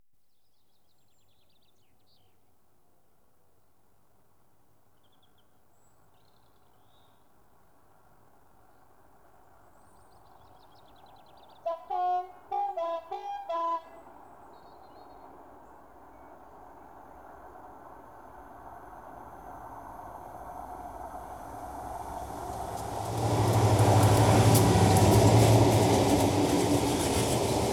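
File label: Trains Passing Diesel heavy goods; BBC Sound Effects Library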